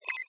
sci fi beep electric; PremiumBeat SFX

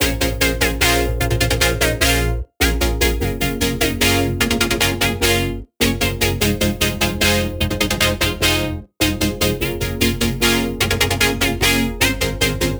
Chord Loop, 150 BPM A Min, Synth; Future Bass; Chord Loops; Heater

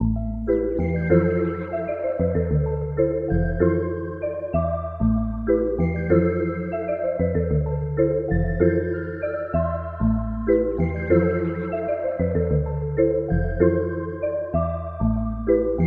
96 BPM A Min, Melody; Rooftops; Cymatics; Infinity Melody Collection

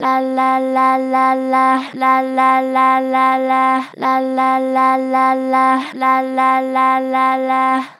Str Flat Lala, Vocals, Pitch Flat Strong

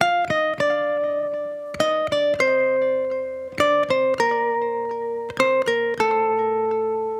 Guitar Loop 100 BPM G Min, Dry; Melodics; Instrument Loops; Guitars; Cymatics; Cobra Hip Hop Sample Pack

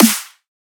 Dubstep Snare; Ghosthack; Dubstep Sound Arsenal

